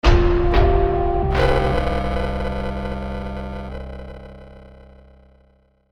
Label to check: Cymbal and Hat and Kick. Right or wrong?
Wrong